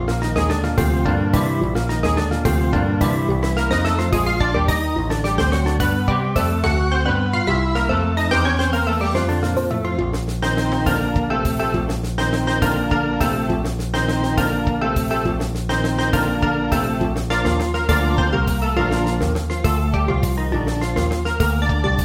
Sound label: mellow rock organ